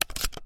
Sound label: m1014 reload, guns; resurviv